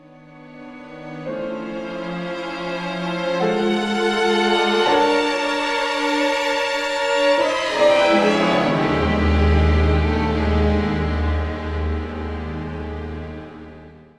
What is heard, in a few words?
FULL ORCA H; East West; Symphonic Adventures